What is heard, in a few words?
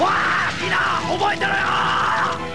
fire